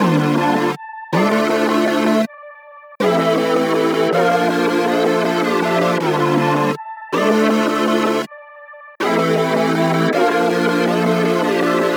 160 BPM Gm; Knights

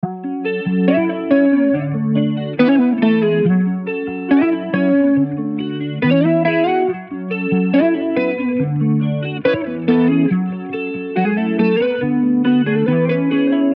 140 BPM Fm; Sapphire

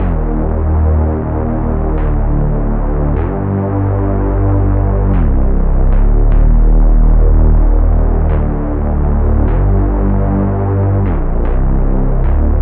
152 BPM Cm; Threat

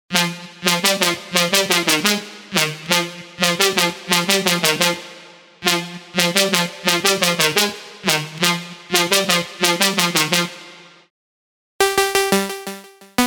Drum & Bass; unisynth

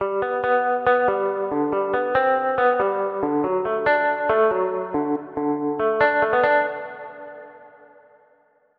Melody Lead Country; midi wizard 2; Unison

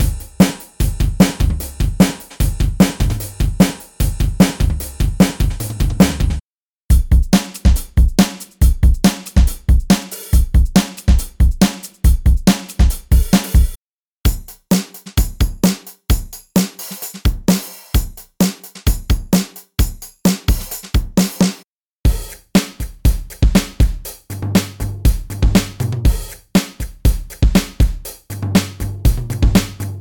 Drum Rock Metal; Drum Monkey; Unison MIDI Wizard Plug-In; Drum Demo

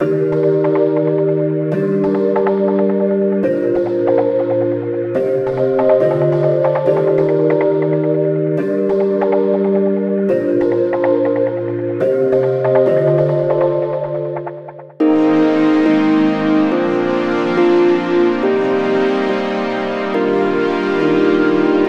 Dubstep DnB

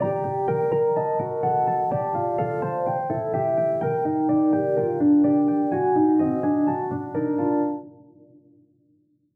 Hybrid Advanced Classical; midi wizard 2; Unison

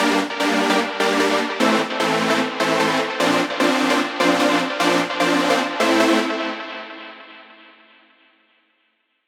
Chords Rhythm Dubstep; midi wizard 2; Unison

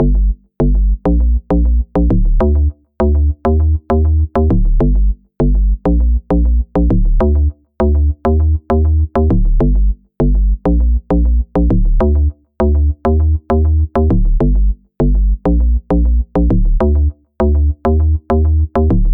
100 BPM Gm; Mints

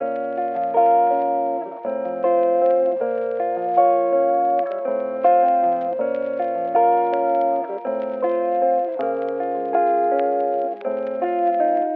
Guitar

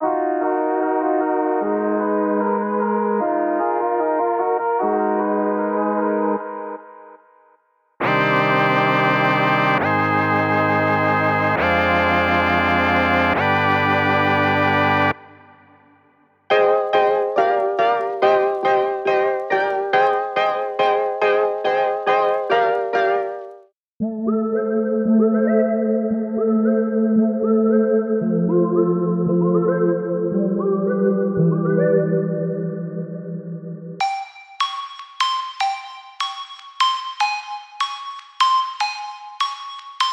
Hip Hop Rap

